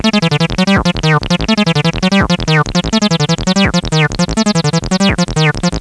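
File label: 303 acid; x0xb0x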